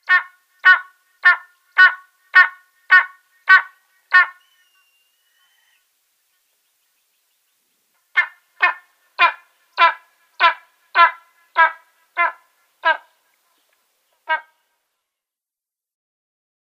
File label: Spanish Imperial Eagle